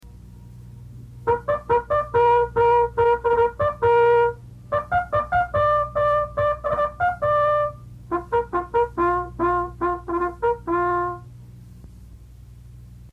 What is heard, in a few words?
Mess Call, bugle calls